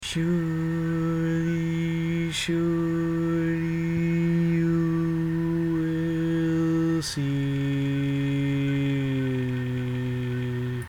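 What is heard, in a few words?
Baritone, Barbershop Tags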